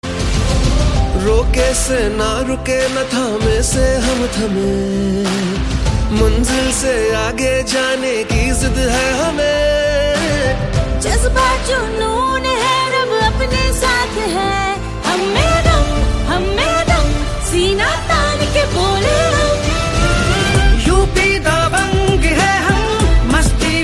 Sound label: Anthem; Indian Pro Music League